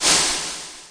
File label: brush roll, Roland TD 7; DRUM MACHINES